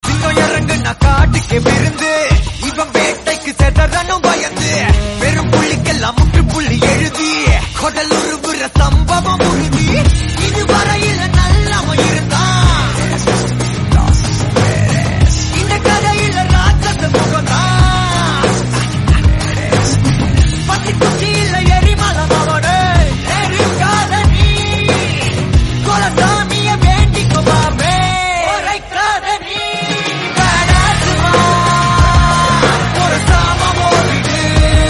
Tamil, ringtone

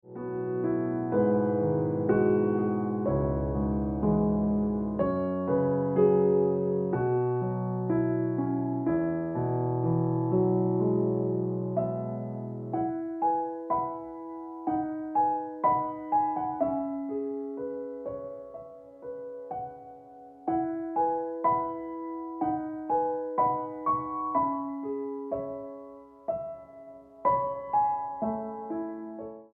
Ringtone Piano Music

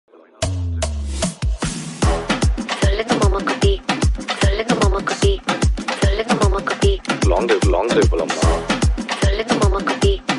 Bgm Tamil; ringtone

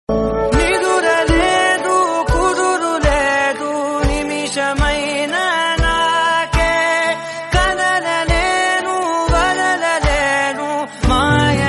Tamil Song, ringtone

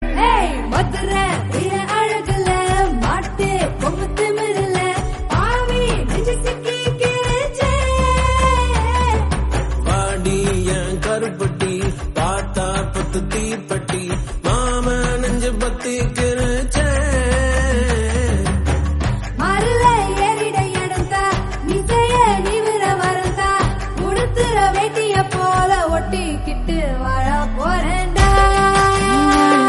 Tamil

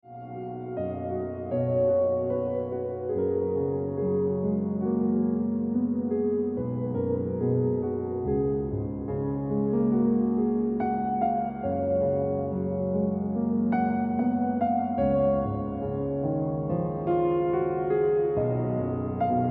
Piano Music

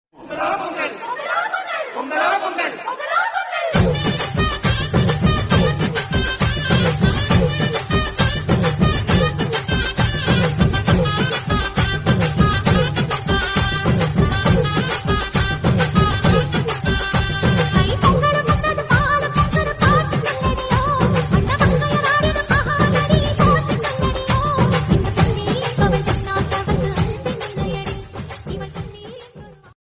Bgm Tamil Song; Ringtone

